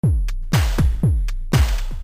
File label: loop